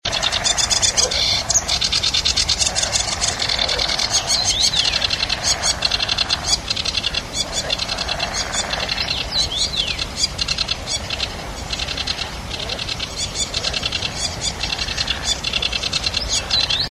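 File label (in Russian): kamyshovka barsuchok, Sedge Warbler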